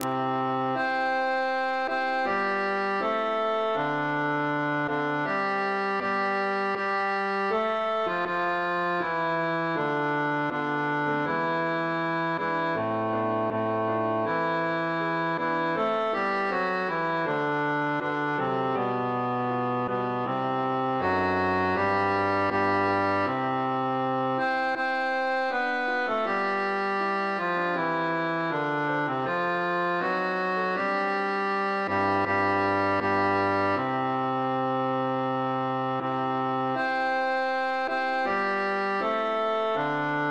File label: bass; morgengebet